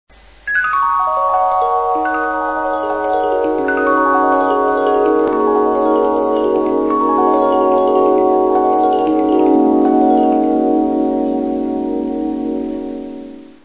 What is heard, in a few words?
magic